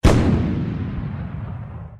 puzzle sound

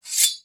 deploy Throwing knife

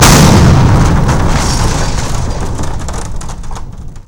cannon land impact

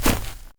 land grass, FootStep; WarRock

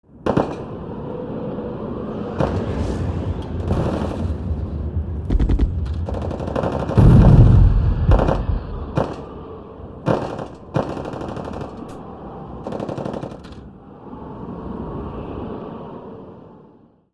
Desert; Ambient

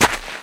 land land, FootStep; WarRock

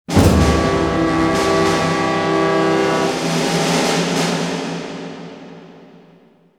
Gameover

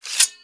deploy Stiletto, Reload

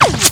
bullet air

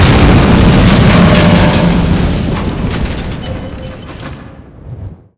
heavy vehicle blowup

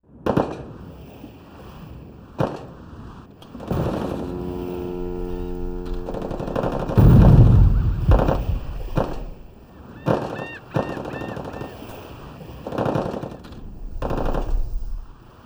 harbor, ambient

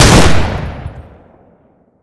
M4 Super 90 fire

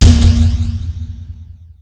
Kill; WarRock

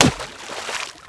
gun water impact

